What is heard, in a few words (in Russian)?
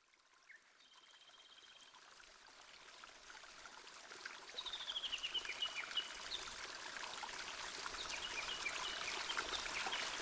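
звуки природы, шум воды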